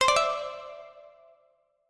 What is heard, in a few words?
notif; google meet captions